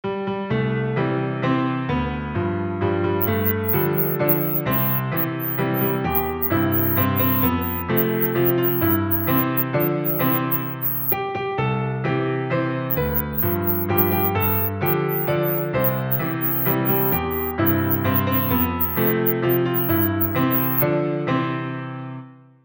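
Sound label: Happy Birthday Violin Music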